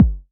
Multi-Kick Short

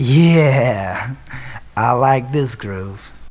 VOX GENERAL; Megabass Remix! 2

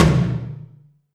tom, Alesis DM5

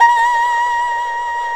ERHU A#4; INIS - Opium

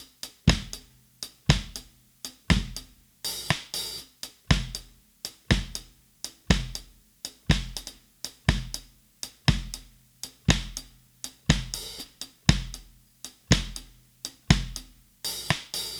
Drums, 70s Analog Funk

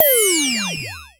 Klone Dual-Percussion-Synthesiser; EFX

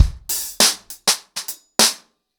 100BPM, ACOUSTIC DRUMS; Sonokinetic - The Roots Drumloops

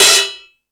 Ambient Rock, drum machine

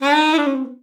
sax d#4, Saxophone slides

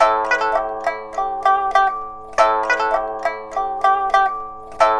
PIPA, 90; INIS - Opium

